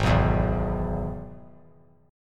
Ab Minor Sharp 5th Ab down; Abm#5 strummed; JGuitar

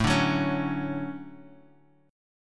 D Major 7th

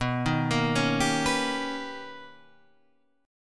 B Augmented Major 9th B Guitar Standard, chord; B+M9; arpeggiated; 7,6,8,6,8,7; JGuitar

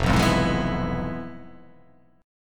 F Minor Major 7th Flat 5th

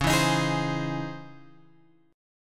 C sharp Minor Major 9th, guitar chord; C#mM9 Chord {9 7 X 8 9 8} strummed

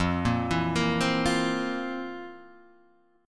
F Major 7th Suspended 4th, Guitar, Drop D; 3,1,2,3,1,1; down; chord shape

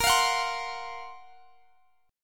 F sharp Major 7th Flat 5th A sharp

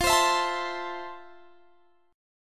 F Major 7th F, Guitar, Standard; strummed; capo 9

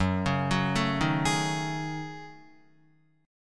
Gb Minor 6th Add 9th Gb down Guitar; Gbm6add9; arpeggiated

